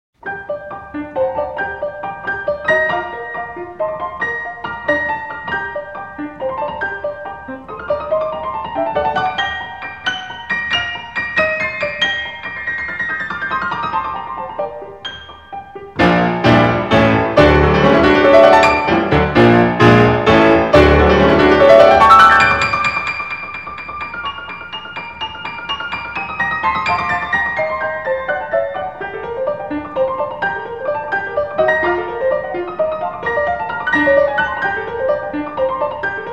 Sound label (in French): musique petipa garcons elementaire